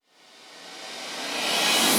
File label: Rise Up Dramatic mood; Bosque